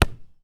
Ball Pass, Soccer; Stuart Duffield; Soccer SFX

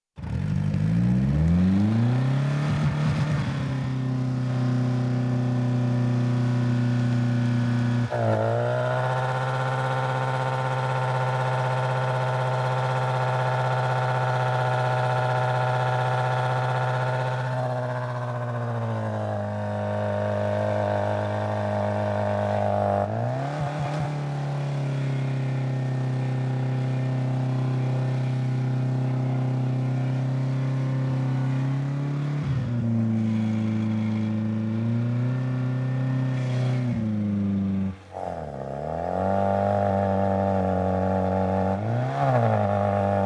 Auxiliary Pump Run, Emergency; BBC Sound Effects Library

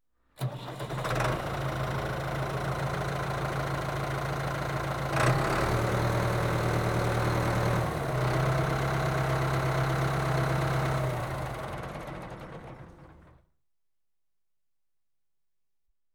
Tractor Ford 7610 Started, R; Farm Machinery; BBC Sound Effects Library

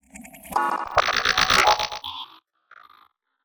GLITCHES, SCI-FI Mechanics; Phil Michalski

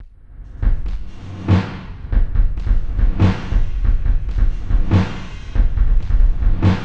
Loop - 140 bpm; 99Sounds; Mangling Audio; Mangled Software; Convolution Abuse

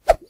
woosh; Kenney's Sound Pack

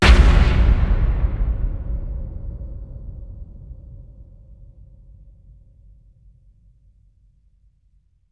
Low Short, Cinematic Impacts; 99Sounds; Rumore

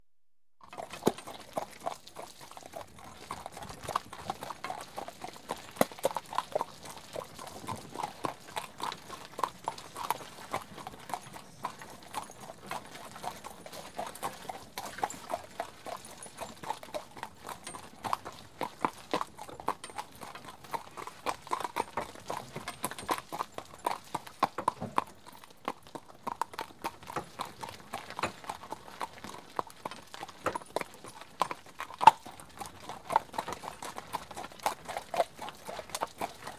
One horse walking along, pulling; Horses; BBC Sound Effects Library

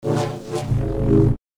Double Bladed Saber Sound; FXHome

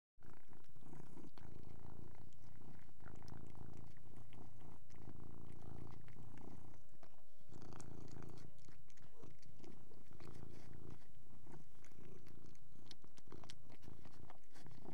Persian Cat Eating And Purring; Animals and Birds; BBC Sound Effects Library

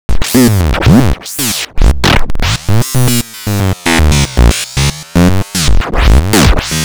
Loop - 140 bpm, Stutter Sounds; 99Sounds; Mangling Audio

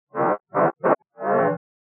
Textures; 99Sounds; The Weird Side Samples

